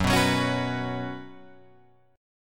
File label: Gb Suspended 4th Gb down Guitar Open F, Gbsus4 chord; strummed; JGuitar